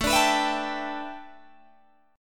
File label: A 7th Suspended 2nd Suspended 4th Guitar Open G